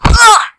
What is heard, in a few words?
fall; quake2; austavin